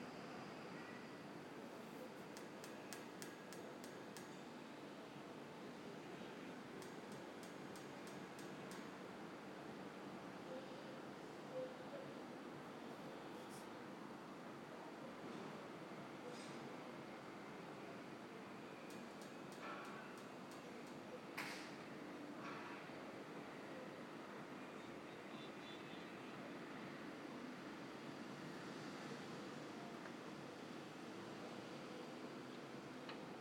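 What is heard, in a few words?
hackney ambient noise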